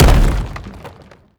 bash medium, brickwall, destruction

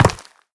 step run, footsteps, player, dirt

